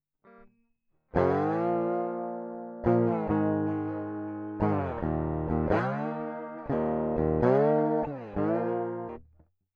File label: Crunchy Blues